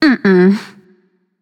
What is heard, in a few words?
disagree, voicepack; smite; pele